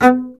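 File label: STR CBS, Solo Instruments, double bass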